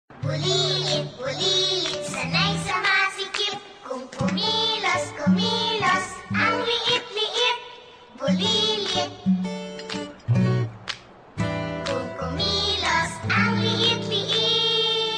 cute tone

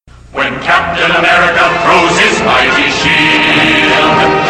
Throwing Shield

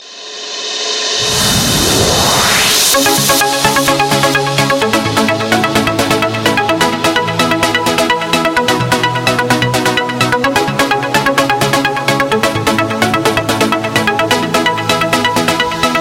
Cool Night Mix

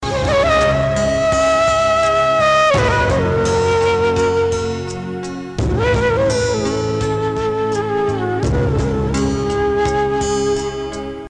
Very Sad Tone

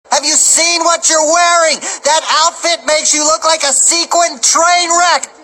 train wreck

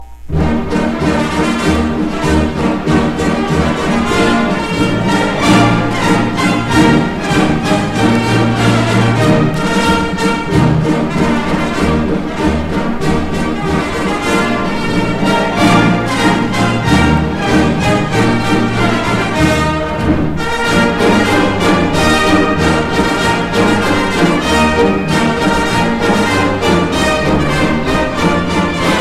Football March

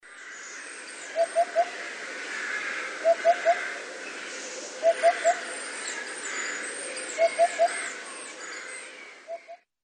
eurasian hoopoe; animals

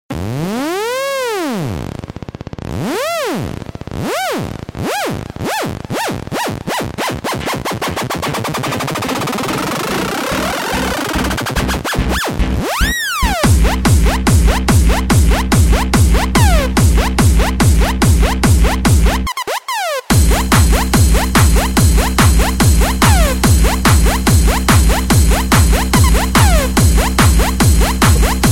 saxophone n drums, jazz; ringtones

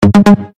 Synth Notification

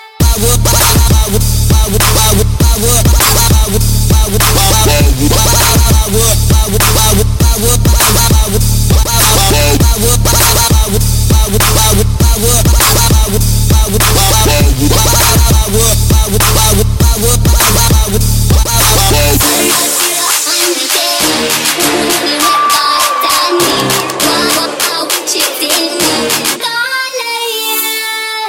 Hard Trap Drop

